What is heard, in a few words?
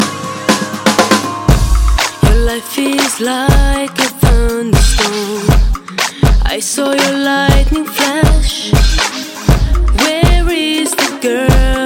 RNB MIXX